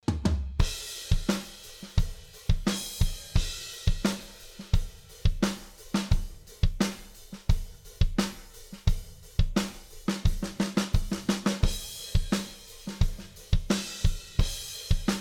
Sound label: reverb, drums; drums-dereverb; unet